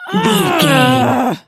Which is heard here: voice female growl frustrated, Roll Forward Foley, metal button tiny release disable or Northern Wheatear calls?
voice female growl frustrated